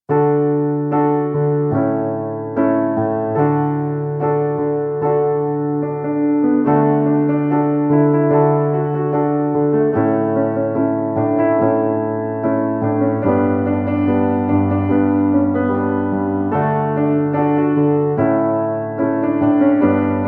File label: Lead Piano Track